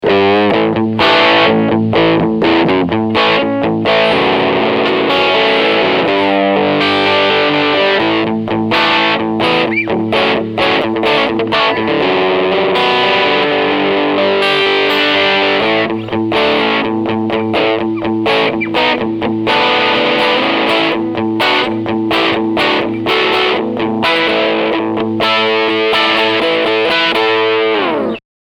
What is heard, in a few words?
Overdrive